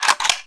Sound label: bolt pull